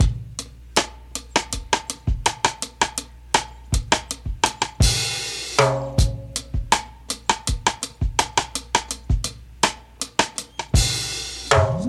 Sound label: 81 bpm drum loop g sharp key; drum loops